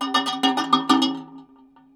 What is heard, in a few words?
Raw, Tin Of Guitar Strings, contact mic; musicradar; contact mic madness